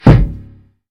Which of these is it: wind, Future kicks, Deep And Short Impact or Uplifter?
Future kicks